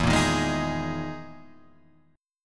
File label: E Major 7th Flat 5th, Guitar, Open F, EM7b5 chord; strummed; JGuitar